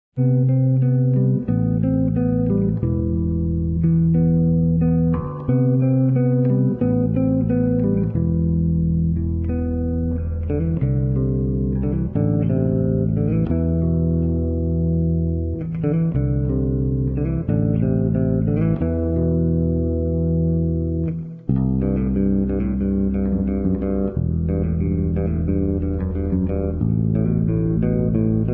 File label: Guitar Electric Bass